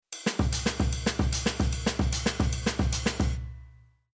Rhythm Modern Jazz

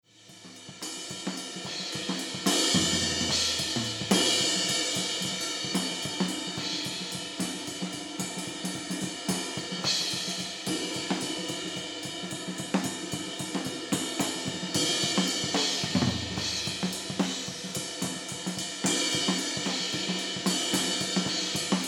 Drums Ride

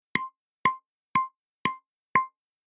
Keyboard Organ Drawbar

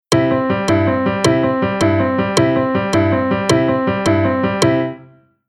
Rhythm

